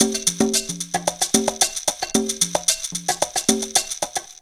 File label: PERC LP, PERC LOOPS; Zero-G; Funky Elements